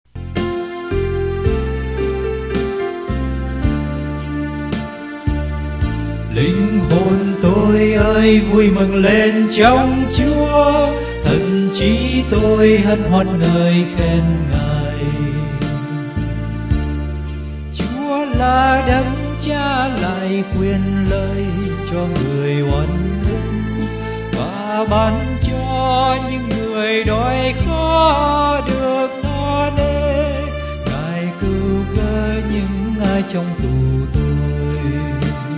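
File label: soprano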